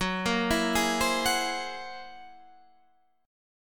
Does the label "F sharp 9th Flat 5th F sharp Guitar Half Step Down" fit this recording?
Yes